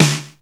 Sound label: RX5 SNR; Hollywood Edge; The Big Bad Beatmachine